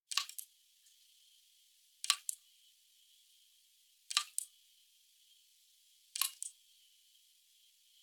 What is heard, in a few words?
Safe Zone Dripping; 1916 der unbekannte krieg gamerip